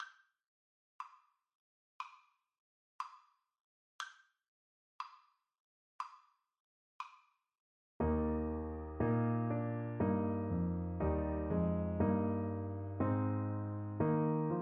trumpet

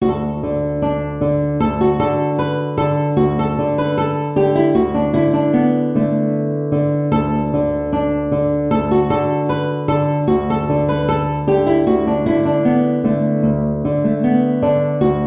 guitar

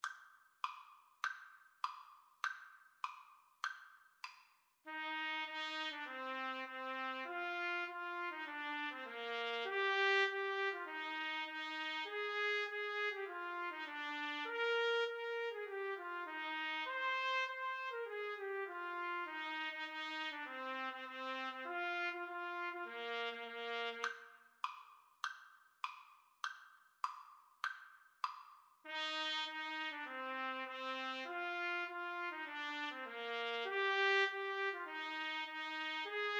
Trumpet Trombone duet

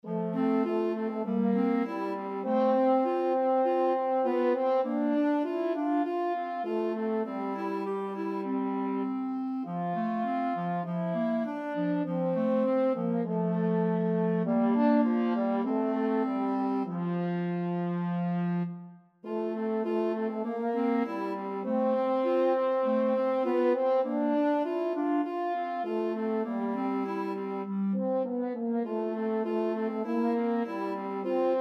clarinet horn, clarinet-french horn duet

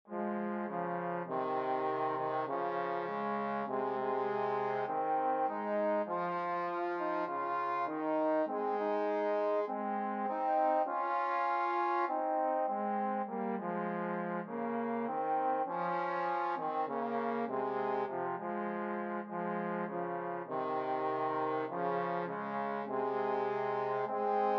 trombone duet

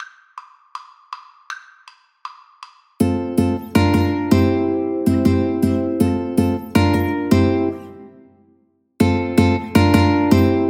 Ukulele duet

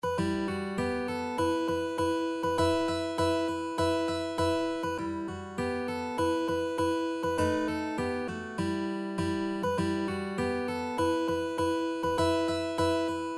wellerman, mandolin

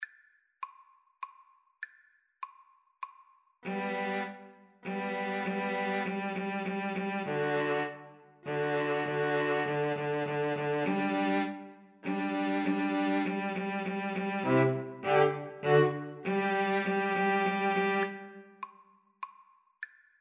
fanfare, 2 Violins Cello; corrette

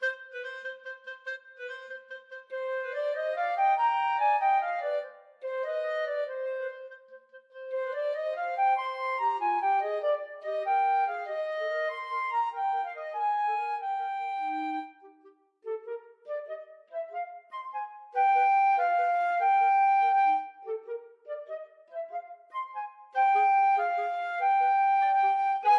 Serenade flute clarinet duet

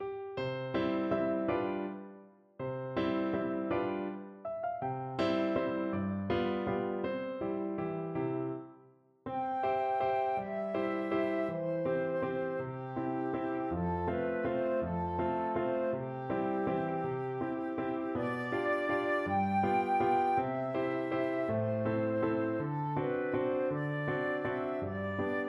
flute

